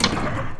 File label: switch; Game Maker 8